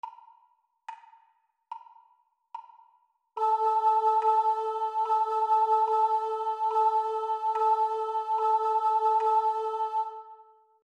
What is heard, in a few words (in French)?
Rythme Haut